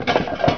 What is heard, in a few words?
Box Noise; SysError